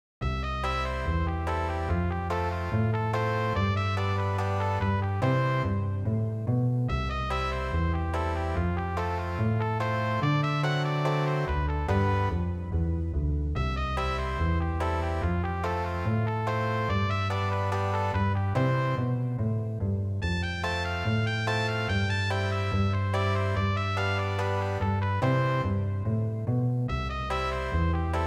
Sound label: Rag Trio